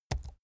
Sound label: keyboard laptop key press slow soft